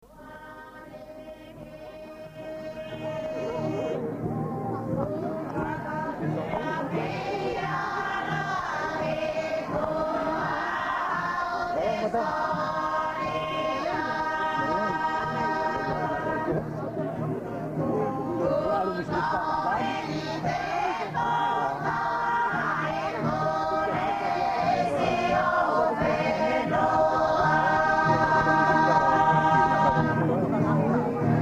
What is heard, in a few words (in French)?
filles, wallis futuna; hihifo